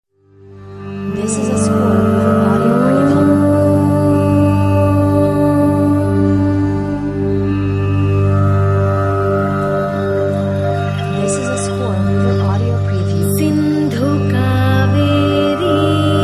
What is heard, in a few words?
indian meditation atmo ommm